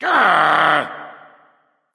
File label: ugh; smite; ahpuch